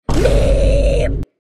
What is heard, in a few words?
scratch meme sound